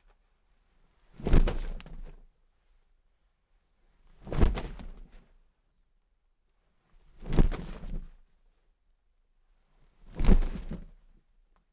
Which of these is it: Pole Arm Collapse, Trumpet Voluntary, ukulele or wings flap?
wings flap